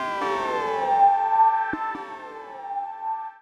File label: KEY SHOT TEKNO 140 A; Techno2; Singleshots